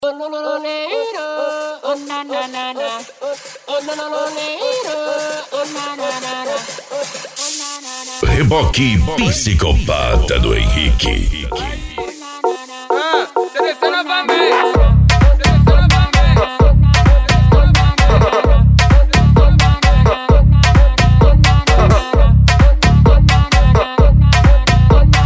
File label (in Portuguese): eletro funk